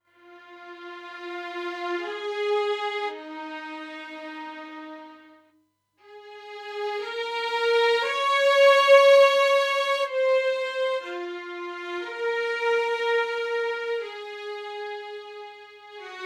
V Solo sustain; オーケストラサウンド; 『新・DTMオーケストラサウンドの作り方』

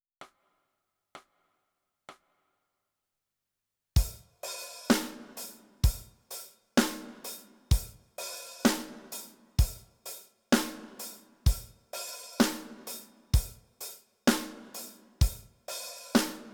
Drums

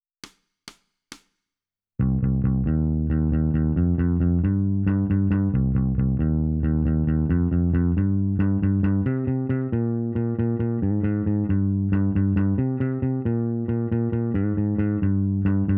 Bass

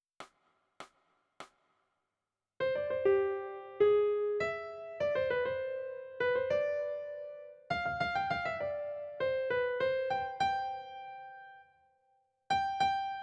Melody

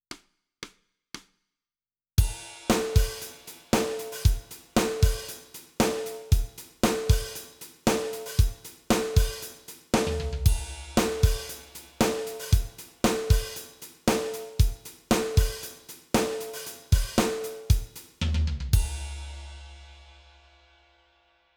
Drums

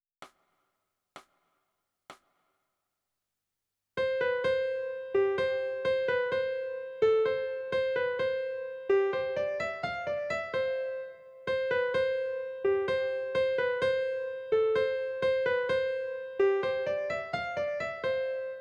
Melody

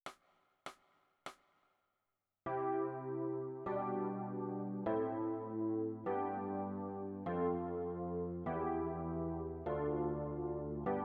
E Piano

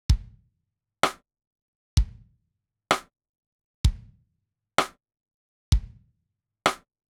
Rim Shot; 耳コピが基礎からできるようになる本

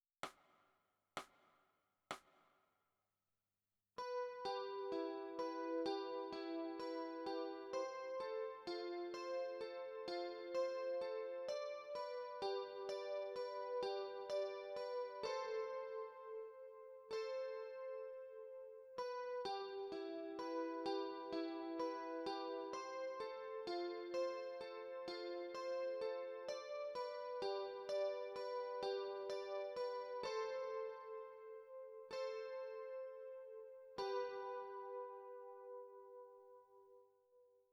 Chorus Guitar

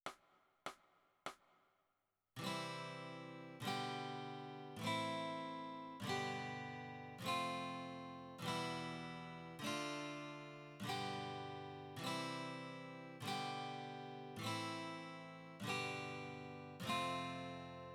Guitar

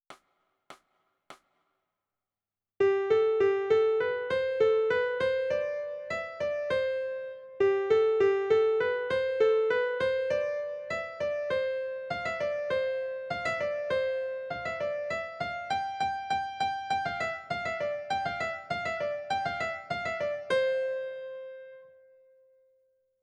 Melody

